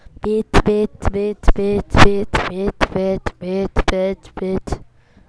beat

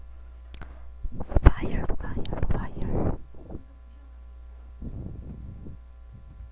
fire

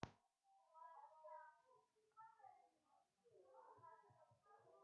click

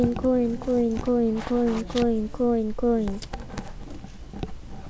coin

